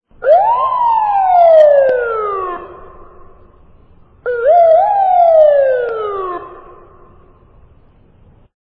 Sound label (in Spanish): Sirena patrullero; alarmas